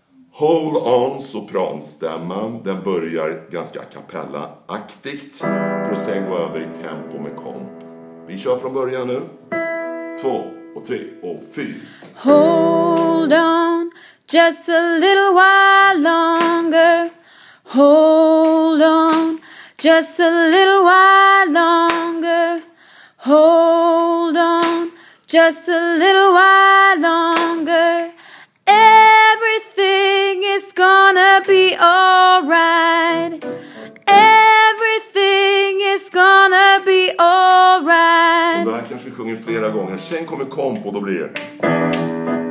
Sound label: Hold On Just - Sopran; Sweet Soul; Gospel